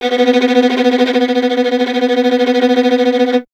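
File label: string violin tremolo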